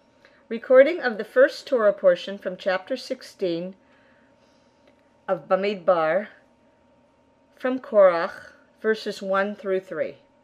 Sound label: Torah